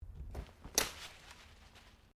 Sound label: Umbrella Close; Objects